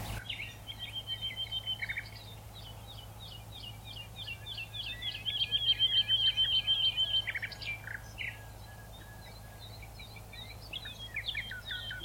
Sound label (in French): Ambiance matinale plaine des Maures